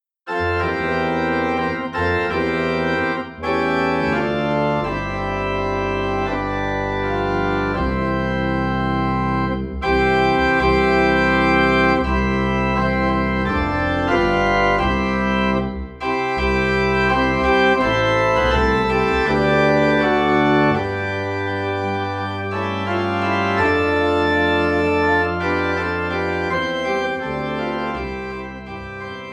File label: Organ in C